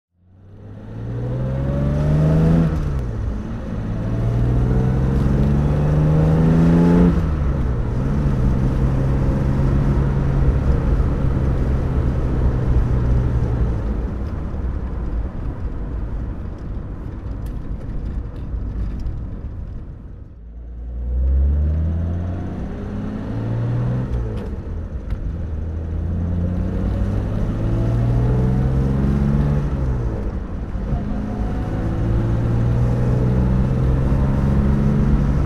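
jaguar etype 42 ots s15 driving, Engine sound driving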